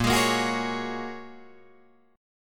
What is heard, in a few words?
Bb Minor 7th Sharp 5th, Guitar, Drop D; 8,11,8,11,9,9